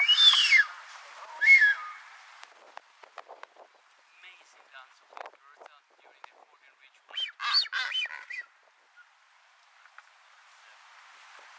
Blue footed booby; پرنده بوبی پاآبی

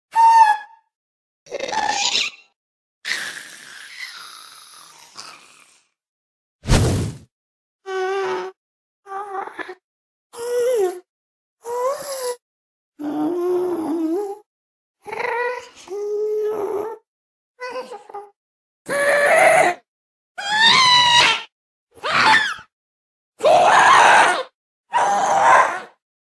Minecraft Ghast; گوست در ماینکرافت

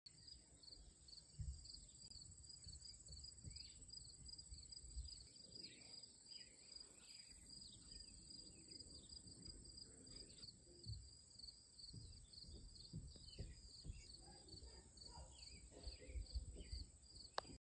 Crickets in summer

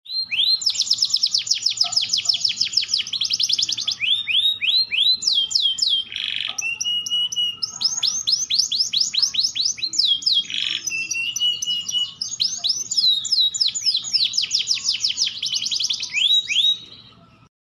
male canary

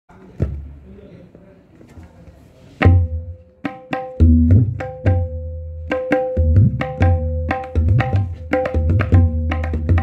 Tabla

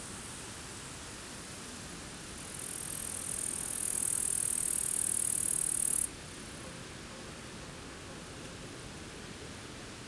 Grasshoppers in The Wind Near Forest

